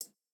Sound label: Jrock hihat